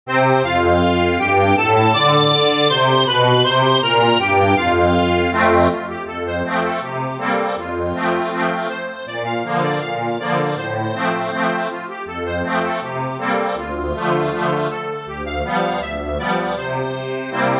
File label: Accordion